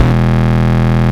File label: Root E, Bass